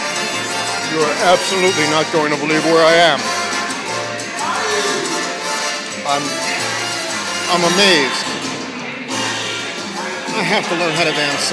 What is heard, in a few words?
ballroom latin dance; westchester